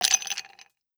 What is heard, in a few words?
Ice Glass; Treasure of Nadia